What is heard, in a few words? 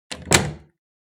Door close